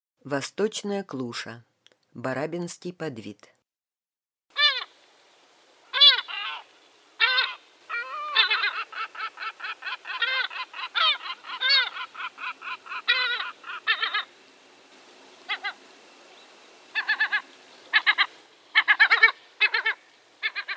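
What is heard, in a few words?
Daurian jackdaw voice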